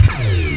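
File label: tank shot; duke nukem total meltdown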